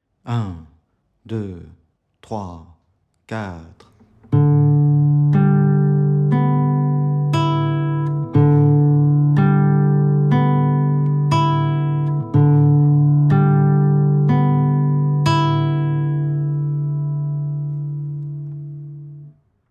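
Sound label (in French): arpege ascendant 060bpm, guitare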